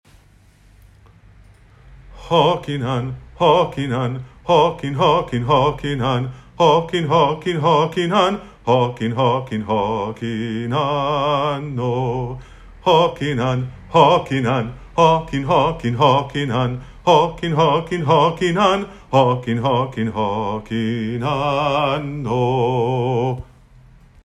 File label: Chorus sung; Hoc Chorus